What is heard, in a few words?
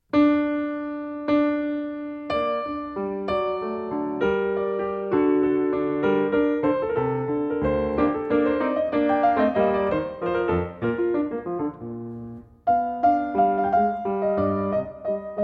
piano